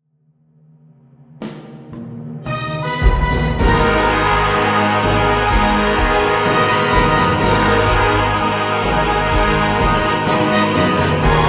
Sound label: Instrumental